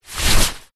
raincoat arm slide into sleeve; foley

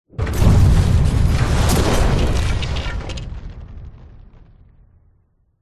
explosion large with whoosh metal glass wood

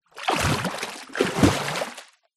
nature water movement deep

